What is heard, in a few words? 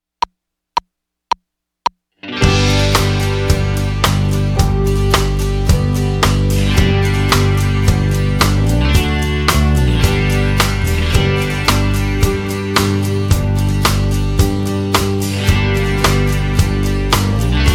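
High Pedal In G Without Guitar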